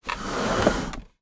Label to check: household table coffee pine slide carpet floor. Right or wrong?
Right